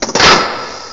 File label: clack